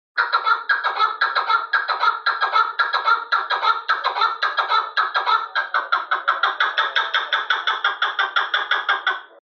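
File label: chukar partridge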